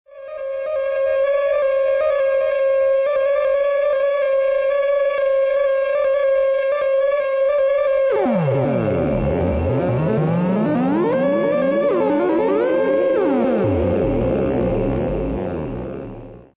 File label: Osc 3 seq